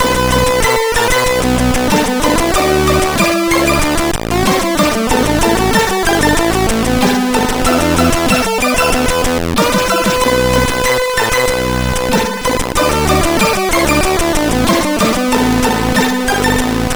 Funky Sound, CSG8580R5

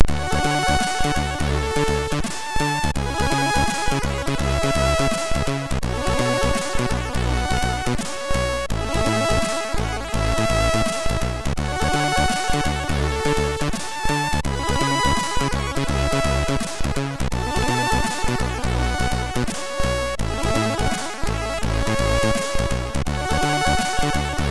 Slammer, MOS6581R2

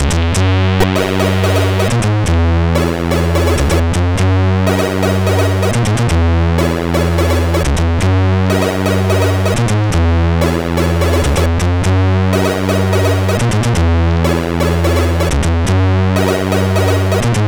Simple Switchord, CSG8580R5

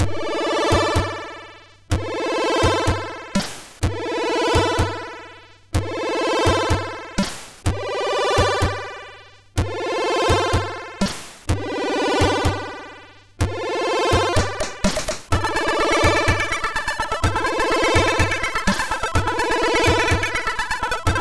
Intro Jam, MOS6581R2

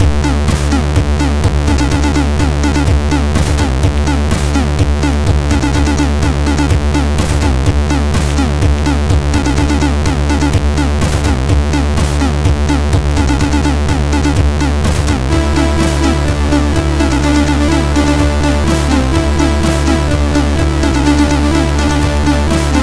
Loader tune sid MOS6581R3

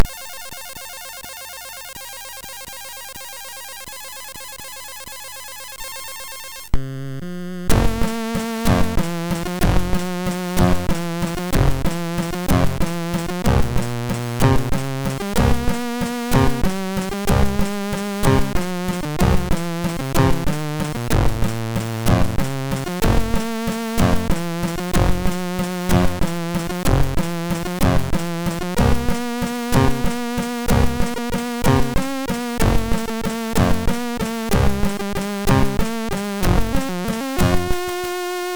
Waltz, MOS6581R3; hvsc; Froyd

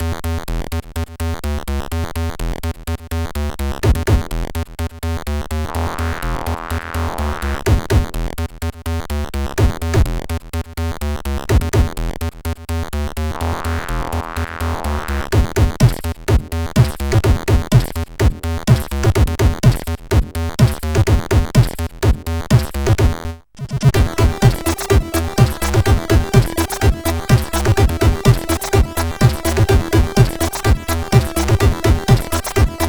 Drums on the Run, CSG8580R5

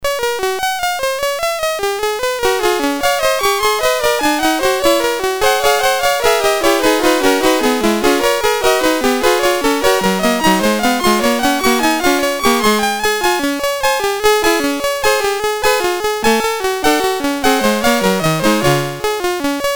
Gigue G Major, MOS6581R4

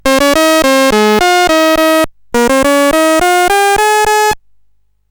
CSG8580R5, GAMES; Spikes Peak

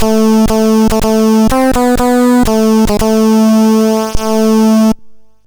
MOS 6581; Death

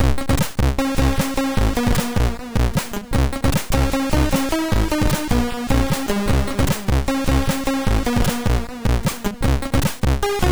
Flammable end, MOS6581R2; hvsc

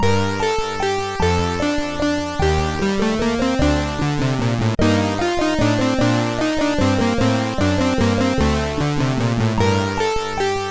Menuet G moll, MOS6581R3; Froyd

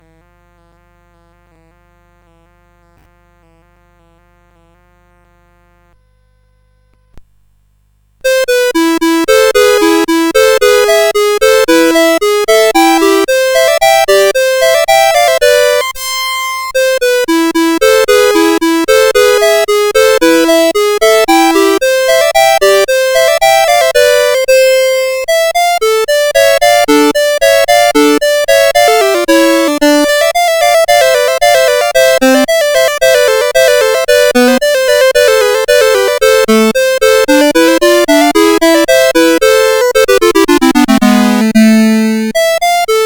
Allemande, CSG8580R5

